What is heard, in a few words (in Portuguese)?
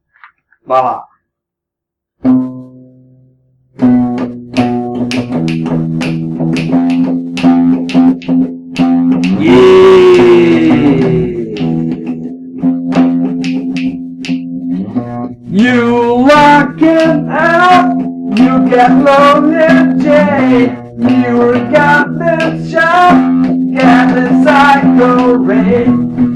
musica